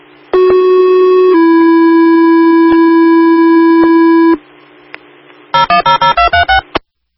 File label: Siren activation and DTMF